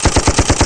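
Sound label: machine gun; panzer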